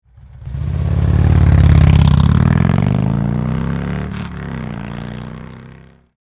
TAKEOFF, 4" Eliminator Slip-On Mufflers For Harley Touring; Exhaust Sound; Vance & Hines Eliminator 400 Slip-Ons; 1995-2016